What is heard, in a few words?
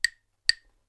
Claves